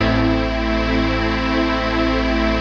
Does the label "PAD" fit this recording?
Yes